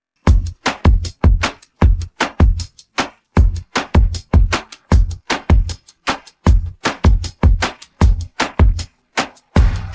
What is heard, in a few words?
drums pattern